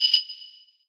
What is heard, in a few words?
katana